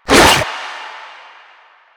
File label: Runner Arm Attack; Enemies; Warframe; Proto Infested